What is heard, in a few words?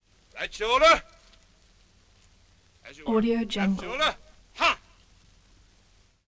troops train